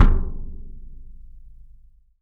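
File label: RESONATING KICK; 3D Drums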